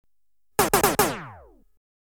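Variable Velocity Gun (Shot Bursts), Electronically Generated Sounds; BBC Sound Effects Library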